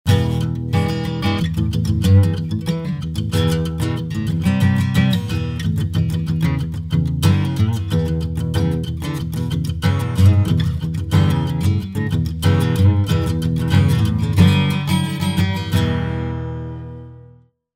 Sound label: B Picking